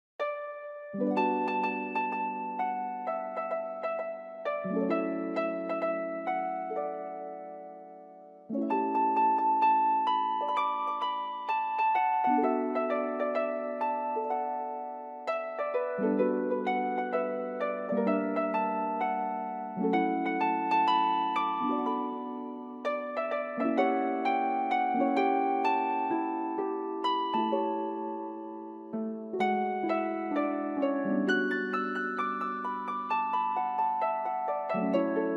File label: harp ver.